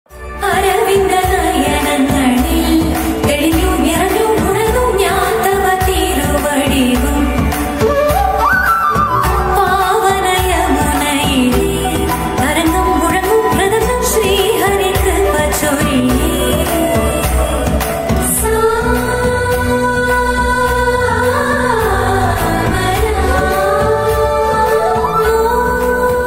Lord Krishna song bgm ringtone Malayalam song ringtone